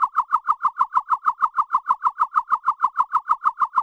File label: Chirp DX100 F2; birds